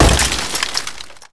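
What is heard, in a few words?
windshield; Cross Racing Championship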